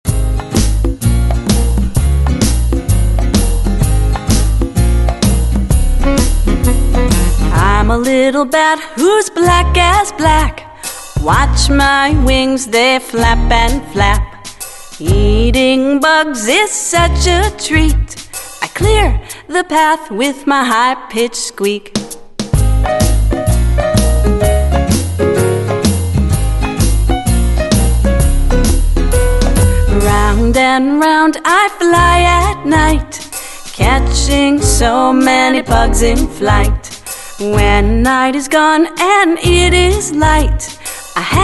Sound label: Bat Flaps